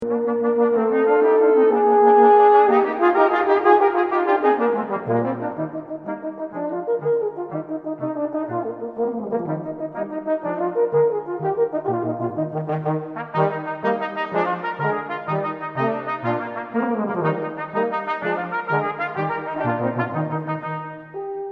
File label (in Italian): CORNO FRANCESE